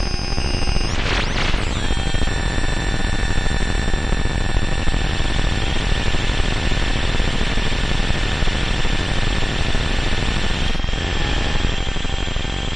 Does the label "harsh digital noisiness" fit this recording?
Yes